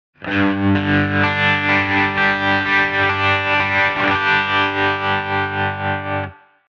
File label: guitar Tremolo drive